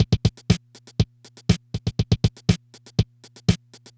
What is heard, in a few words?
Loop, dd-5